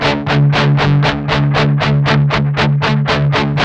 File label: 8TH D#3, Guitars; Spectrasonics; Hans Zimmer Guitars VOL-1; 128-JACKHAMR